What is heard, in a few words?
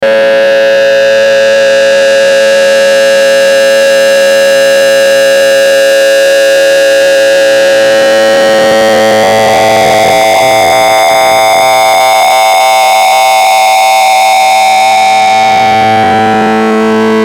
Bed Harsh High Buzz Feedback; Bed Sound Effects